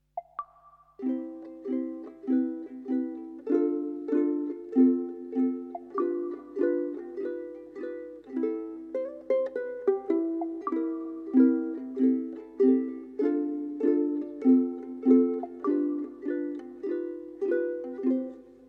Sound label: MUSIC JINGLE; Don't Panic Seaman